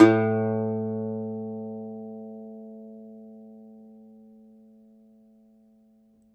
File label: DOMRA, Plucked Strings; E-MU Producer Series Vol. 7 – Old World Instruments